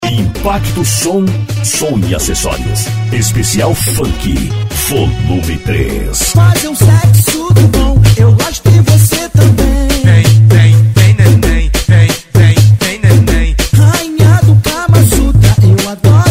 funk bass; impacto som

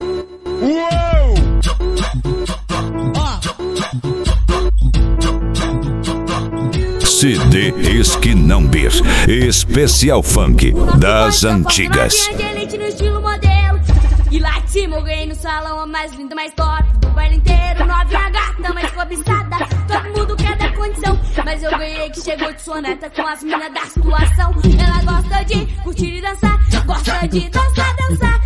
esp funk das antigas; sjinao beer

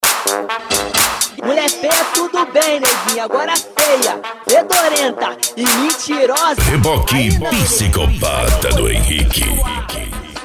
eletro funk

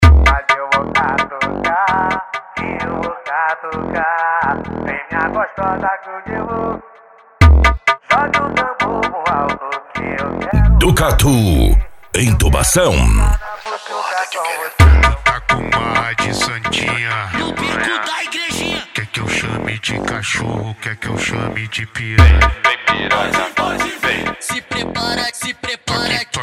funk agressivo

